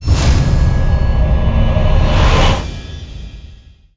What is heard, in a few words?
ghost disappeared; npc